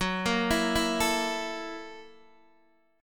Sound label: Gb 7th Sharp 9th, Guitar, Open D; chordshape; down